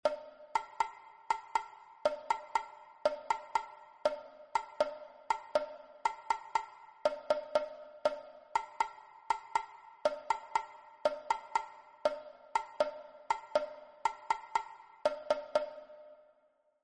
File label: bongos siyahamba